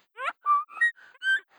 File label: Dilophosaur